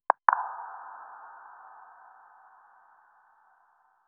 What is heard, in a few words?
ui